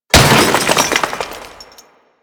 Barricade Destruction Small; Assassinate

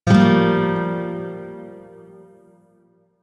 Shawzin Blues Chord F; Warframe; Lotus Shawzin

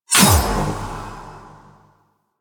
Fire; Warframe; Cephalon Weave; Weave Boss

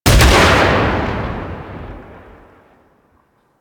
Sniper Shot; Kahl Sniper

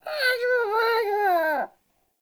Trapped Sprout Vocal; Characters; Friendly; Sproutfolk; Fey Hub Sprout

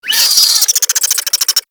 Infested Minion Pain; Warframe

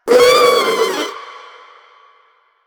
Proto Infested Runner Vocal Short Attack; Warframe

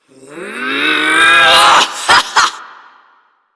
Ability Lift Laugh Lich

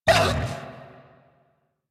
Feral Vocal Pain; Enemies; Kubrow; Warframe

